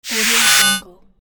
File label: wooshes conversion